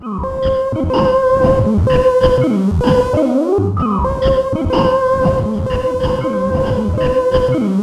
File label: C5, Synth Line; NiceBeats